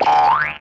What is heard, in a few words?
taunt boing; weapons; dota; sand king